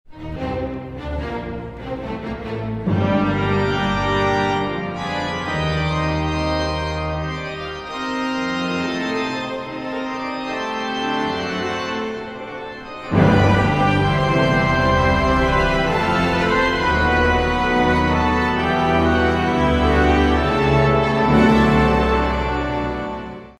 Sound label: Organ Concerto Grave; Organ Concerto No. 2 in G minor, Op. 177-I.